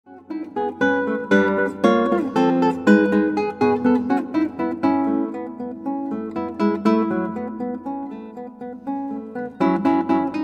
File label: Guitar